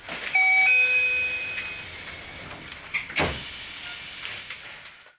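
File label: Door Close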